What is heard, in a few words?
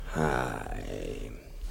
haaaaay